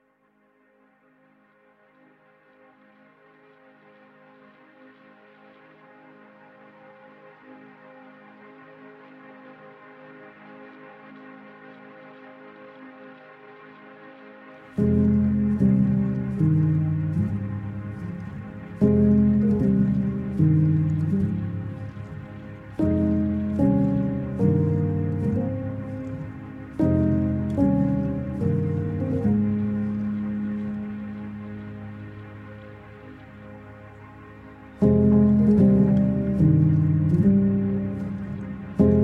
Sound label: Organ